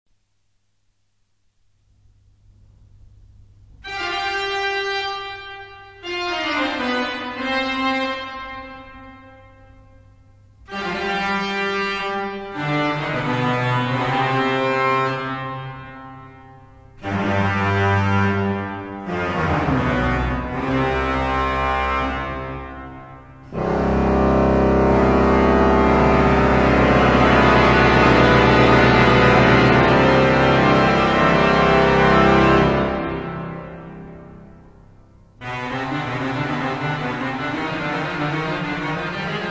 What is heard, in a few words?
Toccata, orgue